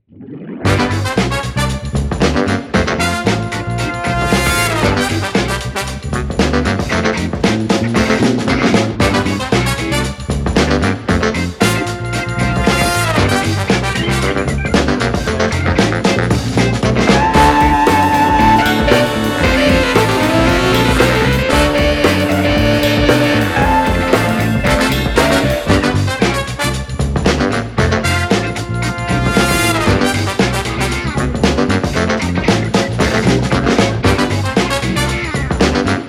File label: Oldtown Funk